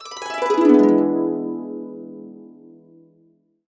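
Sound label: E4, Complete Harps; USB Soundscan vol.62 - Complete Harps; AKAI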